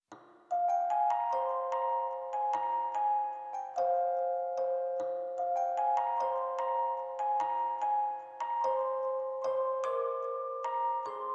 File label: Dark And Dirty Fairy Tales (Organ Version); Goodbye Deponia